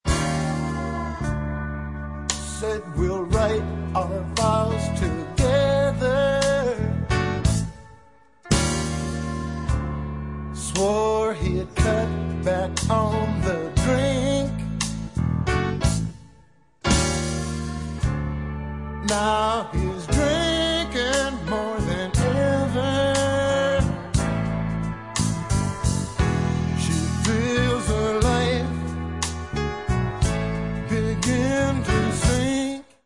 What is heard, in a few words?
hmm